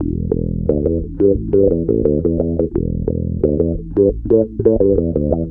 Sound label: BASS, BASS GTR